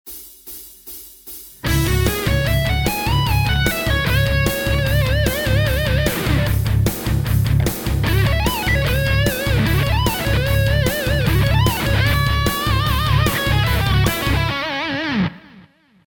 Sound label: Lick